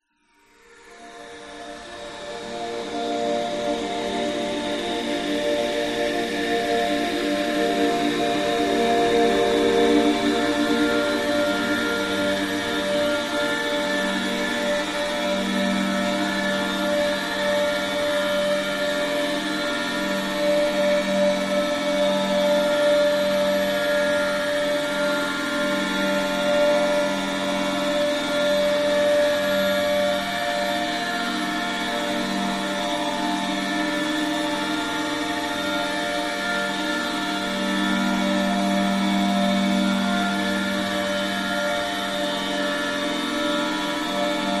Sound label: sound scape dreamy yet sinister ominous confused whine; sound design; zapsplat